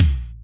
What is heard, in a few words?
Room K, BossDR660 bd; drum machine